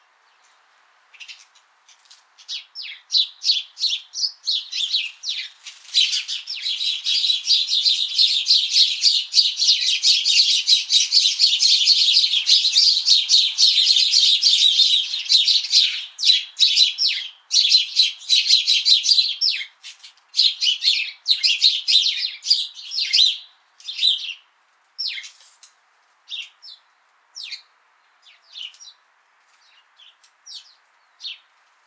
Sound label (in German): Haussperling Passer domesticus